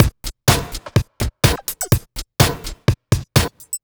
Drum Loops, 125 BPM, Underground UK House & Electro; Sonic Foundry (Sony Creative Software)